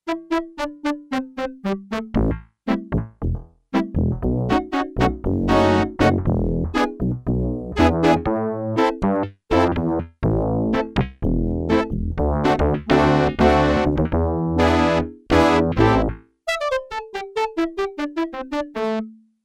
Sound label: Bass-ElecBrass Split, Yamaha TX802; Performances; Factory Patches